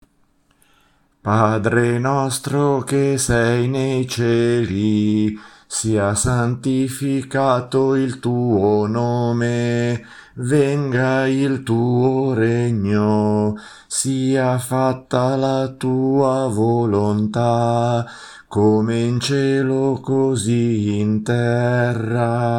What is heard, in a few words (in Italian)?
Padre nostro ambrosiano